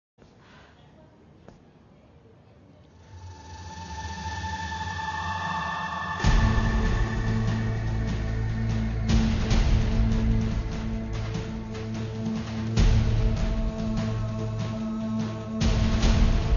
aksiyon muzigi; arka siradakiler

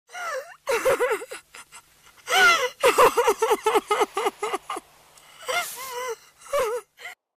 gercek kadin aglama sesi

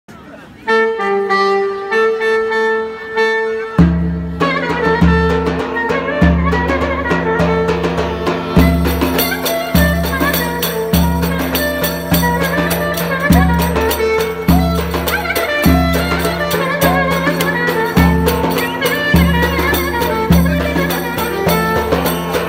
agir ciftetelli; gokcesmeliler

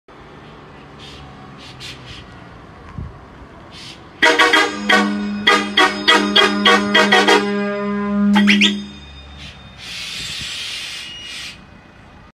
havali korna ventil sesi, Bosch korna kompresoru; ankara; arap korna; dadan korna; islik korna

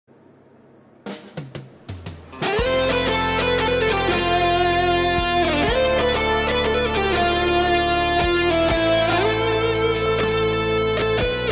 elektro gitar

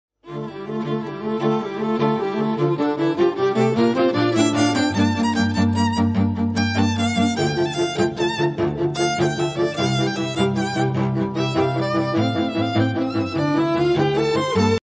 zil sesi keman

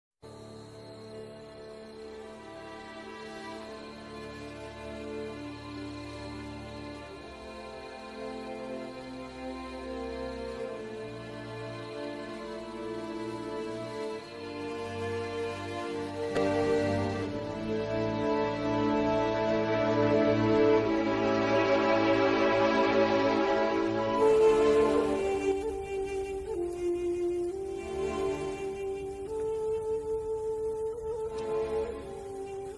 Huzunlu Muzigi

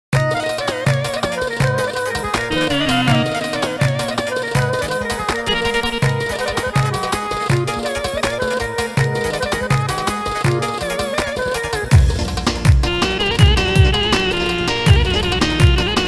ciftetelli, korg pa3x; yeni ciftetelli; korg pa3x pa900 pa600qt

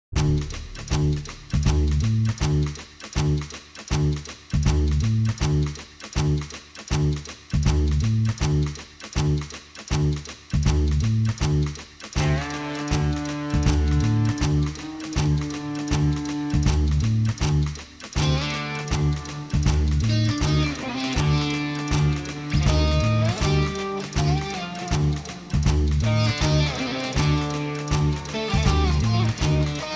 elektro saz; yalniz kurt 2 sezon; dostlar meclisi

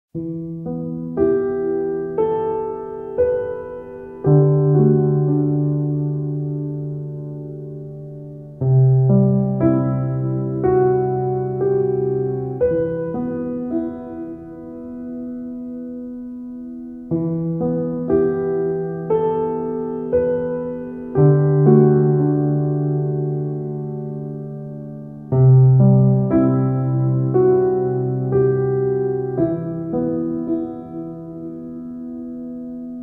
jenerik muzigi; carpisma

